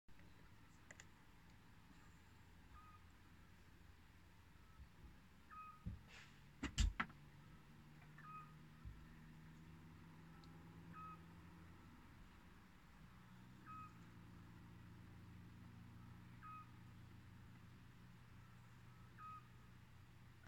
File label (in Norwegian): fugl kroatia